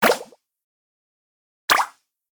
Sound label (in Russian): mult bombochka vodyanaya